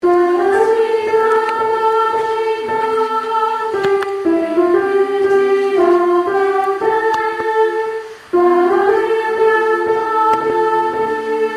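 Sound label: soprano, singers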